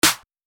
Clap

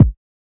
Kicks

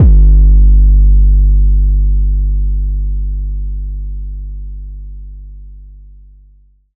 808 G; Cymatics

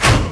Stomp

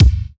kick 80s

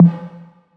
tom hit

